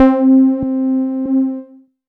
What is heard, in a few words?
C3, Roland JV 2080 TECHNO PAD